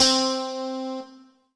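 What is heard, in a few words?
Alesis S4 Plus Clean Guitar C4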